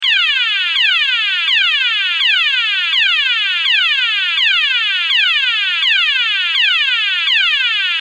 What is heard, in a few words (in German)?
Ton